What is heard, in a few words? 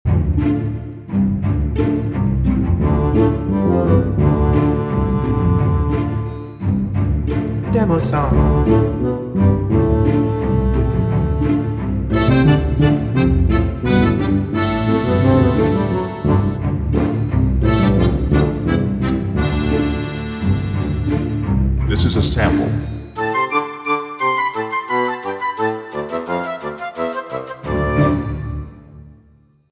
Quirky Little Tale Flute Ending